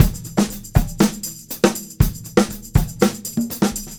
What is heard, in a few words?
drumpack combo